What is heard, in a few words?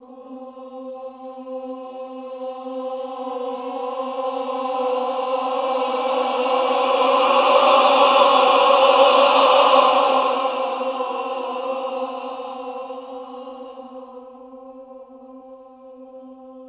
VIBRA XTREME, Choir; Best Service; Extended Classical Choir